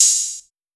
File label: Tight Open Hihat; trap; Hihats; Cymatics; Trap Starter Pack